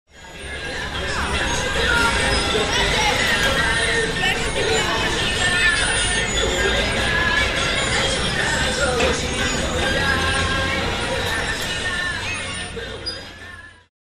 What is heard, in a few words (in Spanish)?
plazas calesita; lopez; Rosario